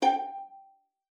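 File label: violin pizzicato G5; Xsample VOL-5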